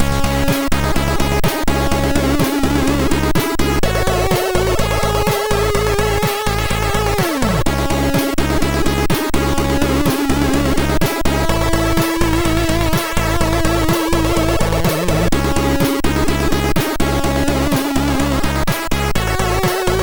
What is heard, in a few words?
sid, MOS6581R4